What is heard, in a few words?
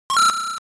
Coin